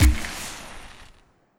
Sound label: Flarehand Shoot; grabpack upgraded; pp3